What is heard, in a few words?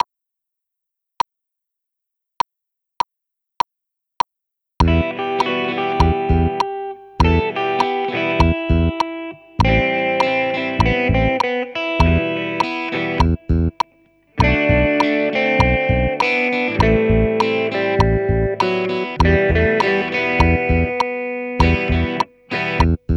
Funky Guitar